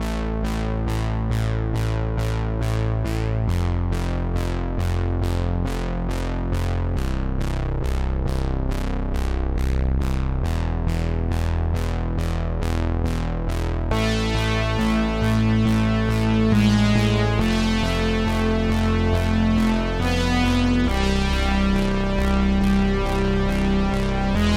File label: Dramatic (short pattern)